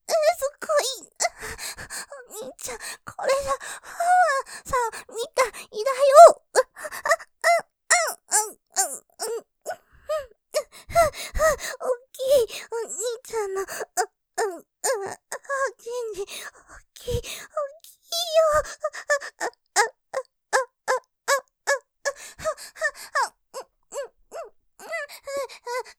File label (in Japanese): 喘ぎ, ASMR; そこぉ良い；；ワンワンスタイル・おっきいちんこ; ループで楽しむ！シェフの気まぐれ抜き抜きコース; モノラル